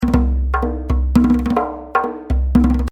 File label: 6 Beat 3-8 - 84